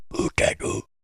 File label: say, zombie potato; minecraft